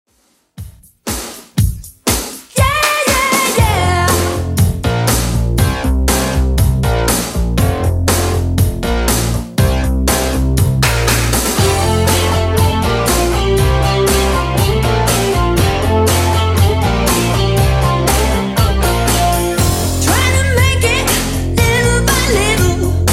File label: 80s Redrum 120bpm